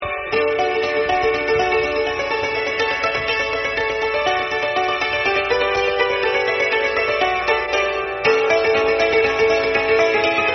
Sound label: Chording; Hickok